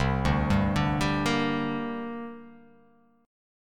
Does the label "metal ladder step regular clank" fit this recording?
No